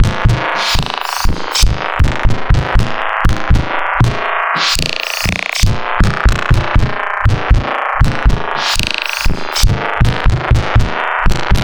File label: Structured Rhythm, IDM Rhythms